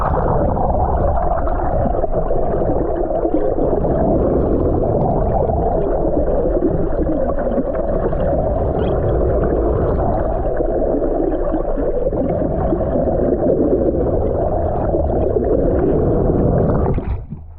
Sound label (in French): nav bateau; bruits profondeur